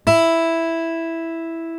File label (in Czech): cela struna; KMITÁNÍ A VLNĚNÍ; hudebni nastroje; CHORDOFONY